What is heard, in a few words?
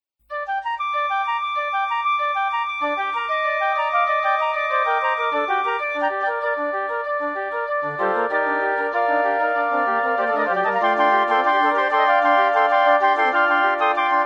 Flute prelude